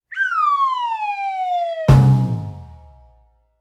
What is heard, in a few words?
slide whistle to drum